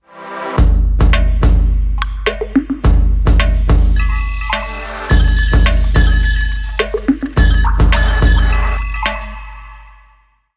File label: Dewdrop, Natural Elements, ringtones; Google; Google Sounds